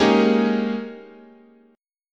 G sharp Major 7th Suspended 2nd Suspended 4th, chord